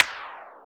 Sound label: CYBER, Drums; Drums In Your FACE!; CYBER PRC